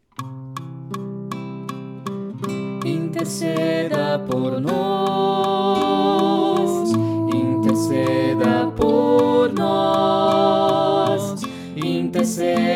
Interceda por nós Todos

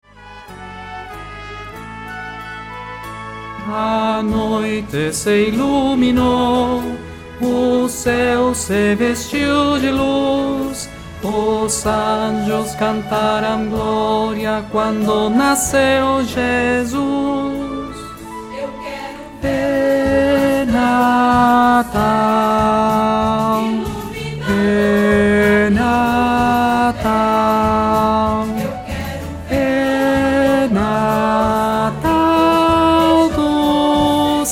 tenor

